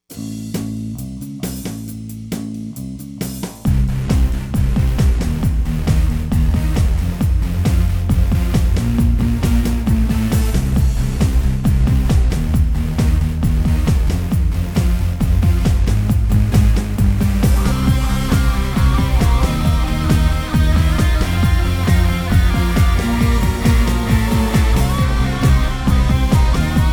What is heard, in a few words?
Techno